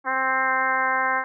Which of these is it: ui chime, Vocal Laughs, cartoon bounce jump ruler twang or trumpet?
trumpet